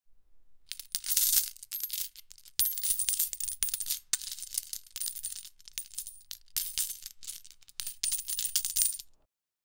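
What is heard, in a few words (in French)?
bruit de pièces d'or qui, coins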